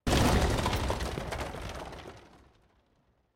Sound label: alarm no resource; interface